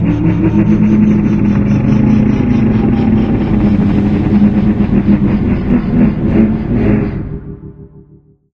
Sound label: gel tubes slide; Source Engine; Portal 2